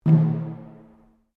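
Drum Taiko Cylinder Rope mezzo forte, Japanese cylinder taiko; Miscellaneous Musical Sound Effects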